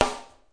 sharp st sd; single hits

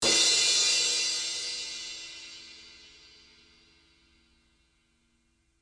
Crash, DrumKit; REAL LIVE KITS; Osama Qureshi DrumKit

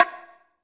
classic hh Bngo; STYLE KITS (Hip Hop, Etc); Classic Hip Hop Mega Kit

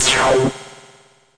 springecho zap, Electronic Hits

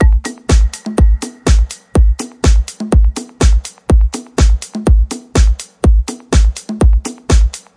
bouncy conga rock house

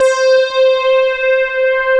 Lead, Synthesizer Middle C Notes; multisampled; synth keys

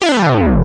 gliss bass; Basses

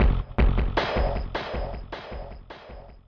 lofi delay beat, drum loops and breaks, 078 bpm; 000 to 080 bpm

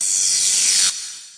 Electro FX; Ueb HE Electro FX

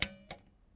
metallics, Typewriter Kit

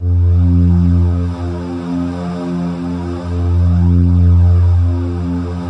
breathy F2, male singing

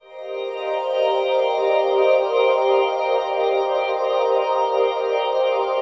shifted glass c min, pads

